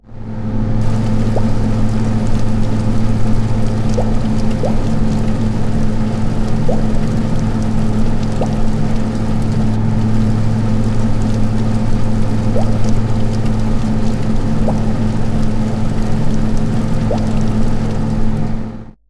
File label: Boiler Gurgling Dripping Noise